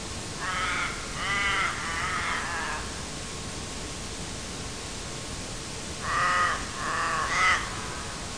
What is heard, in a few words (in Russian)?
voron corvus corax